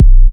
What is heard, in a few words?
Minimal Kick, Drums, Kicks